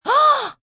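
Gasp Inhale Female, Gasp, female, inhale & exhale (human voice); Gasping Sound Effects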